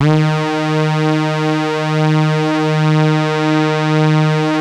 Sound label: D#, GLIDE, Synth; InVision Interactive; Keith Emerson; The Most Dangerous Synth and Organ